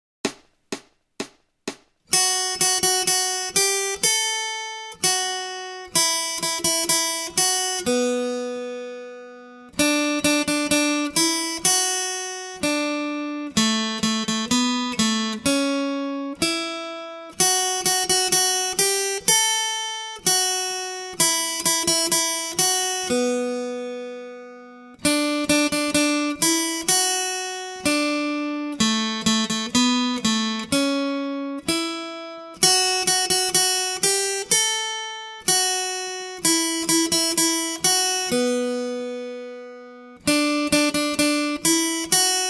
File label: Acoustic Accompaniment, acoustic guitar